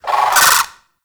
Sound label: Amb Corrupted Bird Close; Ambience; Mendicant Area